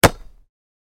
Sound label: Basketball Catch